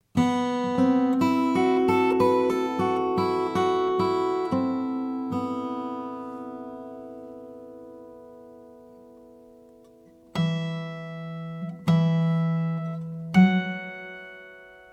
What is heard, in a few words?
Soothing Acoustic Guitar Japanese